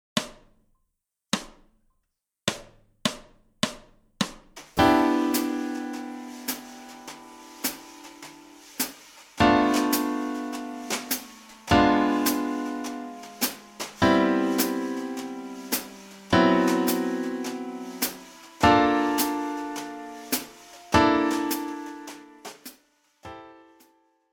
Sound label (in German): ii v i in dur und moll